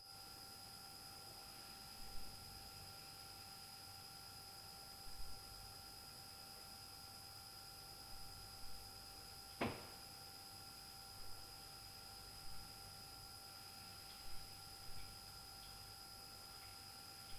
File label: TOC TAK TOC (DIALOG), Night Noise